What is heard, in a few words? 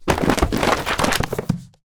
many books drop